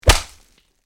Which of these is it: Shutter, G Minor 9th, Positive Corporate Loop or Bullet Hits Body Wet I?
Bullet Hits Body Wet I